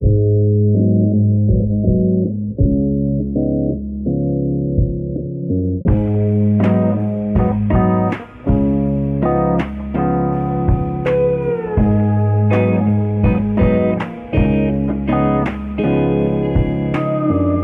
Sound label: guitar